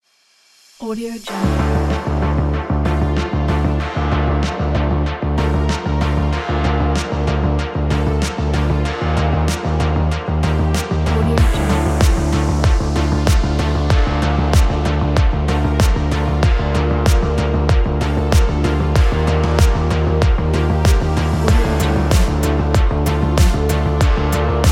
synthwave cyberpunk

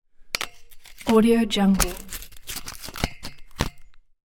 object grating metal

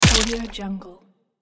monster bone break

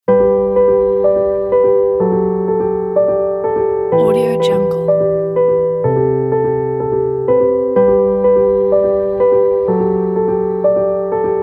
inspirational and uplifting

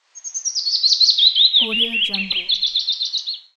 bird; magicsoundflower

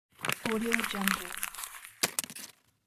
crumpling paper into the trash

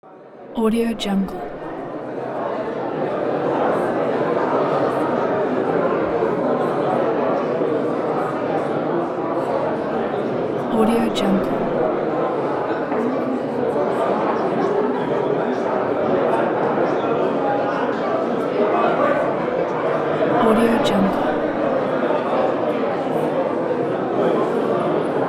large crowd talking in hall

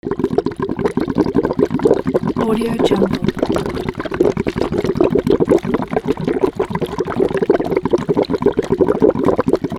bubbles

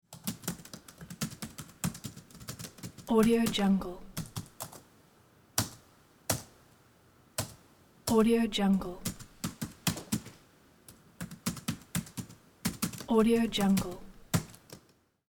typing keyboard

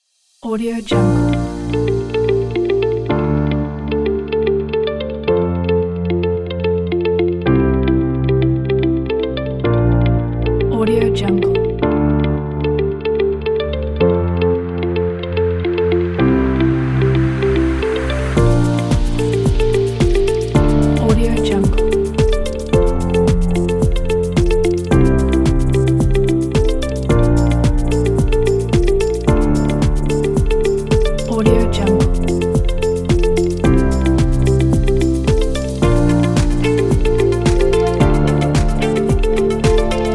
corporate modern tech; bodmusic

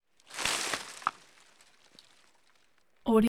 dry brush impacts; prosoundfx

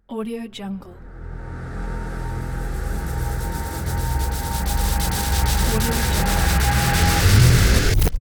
cyborg

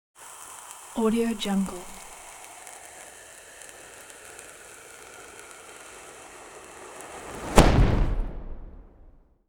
potion fizzes and explodes; hollywoodedge

